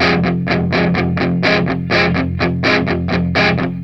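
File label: 125, Vintage Blues Guitar rhythm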